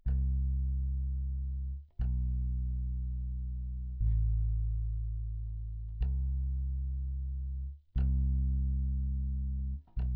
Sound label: bass